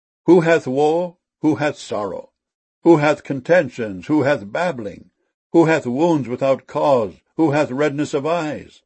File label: babbling